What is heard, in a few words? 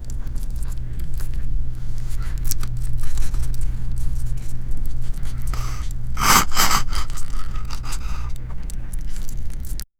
sexy scene sound